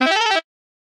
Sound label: sax; Shadowguy